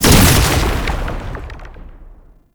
quick strike cast impact; Roathe; Warframe; Tau; Abilities

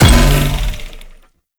Bowl Land; Warframe; Grendel; Grendel Deluxe

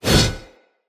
Whoosh, Swords; Weapons; Mockery; Mockery Stultion

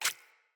Root Spider Step Water, Foley; Animals

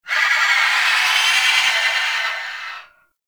Eye Projectile; Ambience; Gameplay; Warframe; Orokin Moon

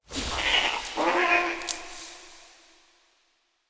Beaver Mote; Animal Motes

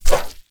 Pool Noodle Whoosh

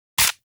Akimbo Pistol Mech; Grineer; Weapons

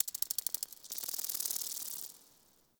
insect night; Ambience; Warframe; Eidolon Plains

